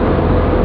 comms; Deep Fighter

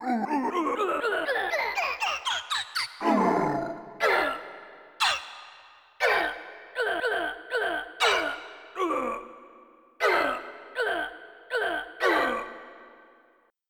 hit; Populous Demo

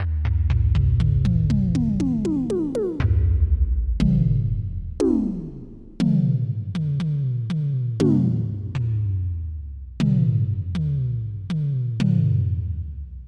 Synth Drum; EverQuest

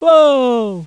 FALL; mordor

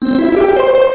PUP

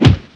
pipe hit body, weapons melee; KINGPIN

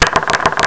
machine

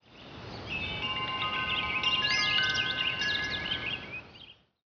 recreation, Ambient; Revista CD Expert 45; ZEUS

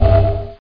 key

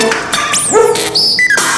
Spike

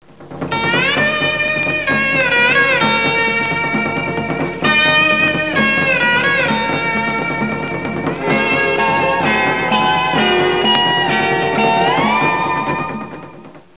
ALOHA

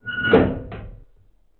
lever

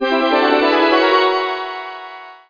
X NEXT; CD Action 47a 2000

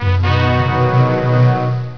Finish Level Puzzle; Giza 2.0

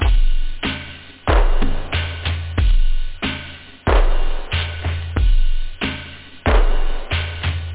break; da capo vol1; loop house

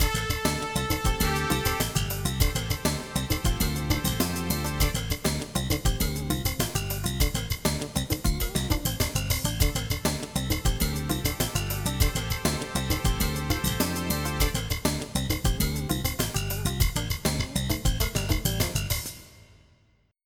funky; Exploring the Boundaries of Sound & Vision

